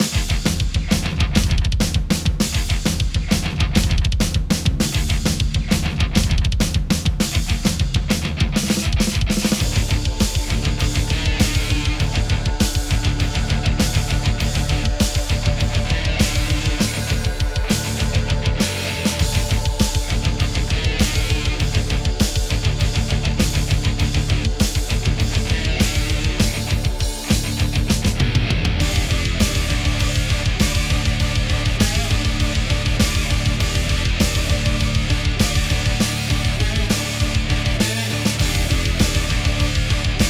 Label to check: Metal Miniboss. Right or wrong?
Right